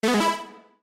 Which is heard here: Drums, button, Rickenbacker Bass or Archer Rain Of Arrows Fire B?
button